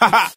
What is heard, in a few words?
ha ha